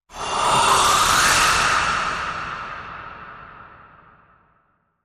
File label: Monster Breath Sweep